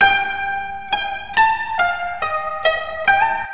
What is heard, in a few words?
KOTO LOOP; USB Soundscan vol.60; Asian Traditions; AKAI